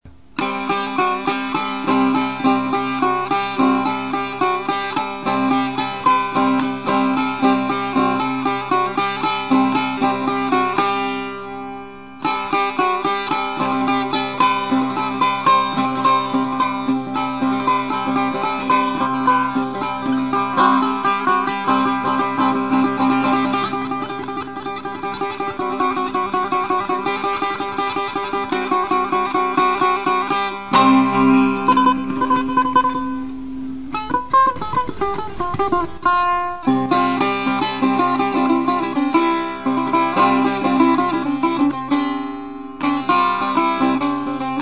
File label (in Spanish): chahargah zanguleh, Musica persa